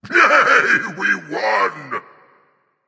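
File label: non verbal yay, voice; cernunnos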